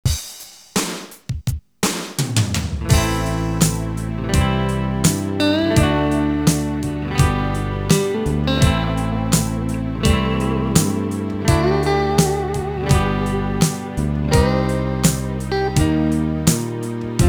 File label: solo